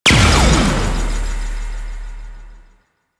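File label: Ability Interrupt Explosion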